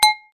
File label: Glass bottles clinking together